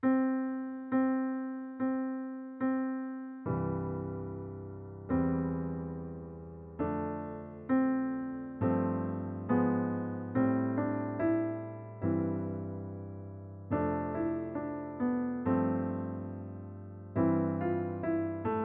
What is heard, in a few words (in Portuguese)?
Playback Prova Melodia Cantada